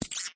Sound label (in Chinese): 桔子音效